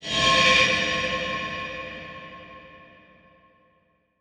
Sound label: Transition Metallic Drone; Production Element; Title Transition; AdobeCC